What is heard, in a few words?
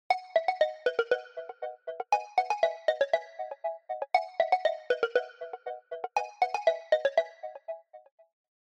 Smartphone Ringtone Dry